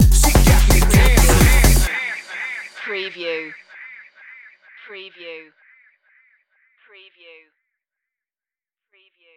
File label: dancin stinger